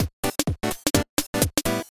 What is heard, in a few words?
bgm remix faster; Grumpy Cat's Worst Game Ever; Lucky Kat Studios; android